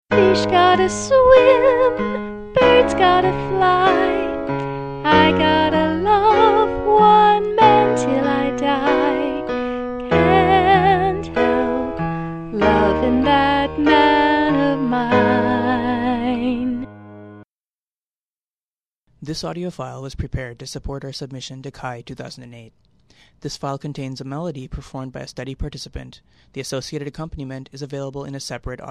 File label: lovin dat man piano